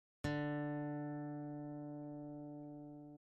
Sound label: Tuning D, guitar